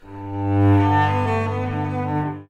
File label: Bach cello suite